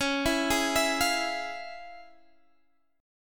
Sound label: F Minor Major 7th Sharp 5th Db, guitar chord